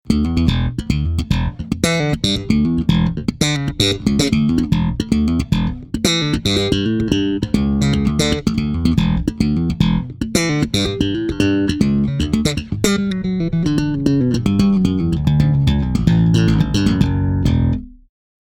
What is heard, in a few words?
bass slap happy